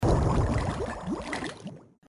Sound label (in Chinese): 浸泡